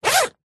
foley luggage rucksack backpack zipper fast; zapsplat

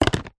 plastic lunch food pot place stack inside another; foley; zapsplat

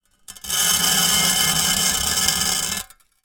industrial shovel metal large drag concrete; zapsplat

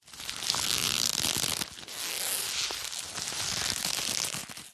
foley paper wrapping handling squeeze

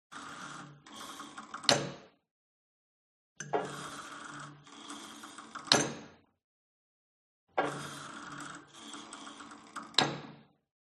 SPORT GYM Cable Machine Old Pull And Drop Soft; smartsound

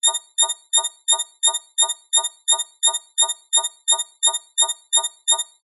science fiction alarm small tiny thin beep loop; zapsplat

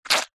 cartoon squelch

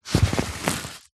hospital blood pressure machine arm band tighten

